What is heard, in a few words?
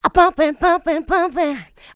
Female Vocals; Houseworx